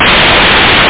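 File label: hi hat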